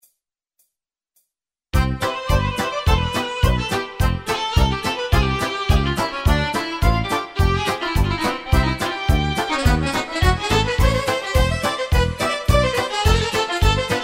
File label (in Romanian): Am plecat candva de jos vocal